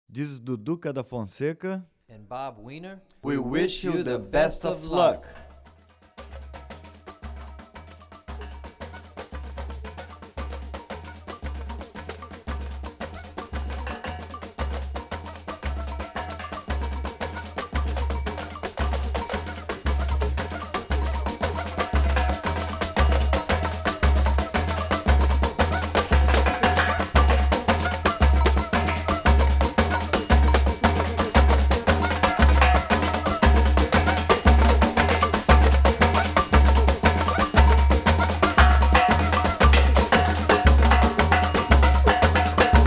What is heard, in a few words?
Batucada Reprise; Fonseca Weiner Farewell; Brazilian Rhythm For Drumset; DaFonseca, Weiner